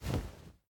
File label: Cloth Jump